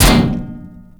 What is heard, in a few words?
Metal Debris Impact; Gameplay; Warframe; Tau